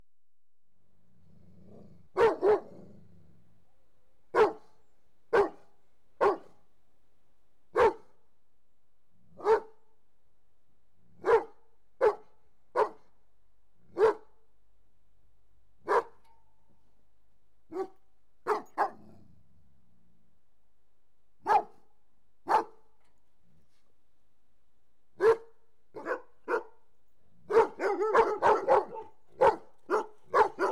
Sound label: Doberman Barking Then Joined By B; Horses & Dogs; BBC Sound Effects Library